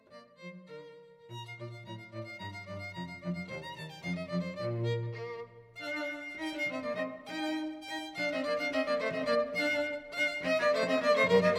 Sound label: duo Violin Cello; Handel; The Arrival of the Queen of Sheba